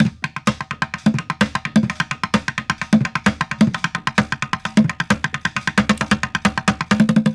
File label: Street Groove, Ambient Grooves